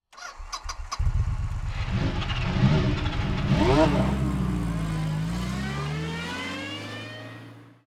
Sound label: Bike